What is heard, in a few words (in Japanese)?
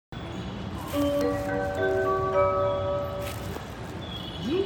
JR 神戸線 六甲道 東, train sounds